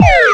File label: pull response